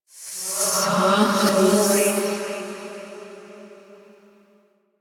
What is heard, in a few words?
Hand Compass Vocal; Warframe; Duviri